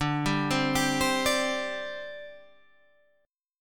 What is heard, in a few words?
D 7th Suspended 4th, down, Guitar, Half Step Down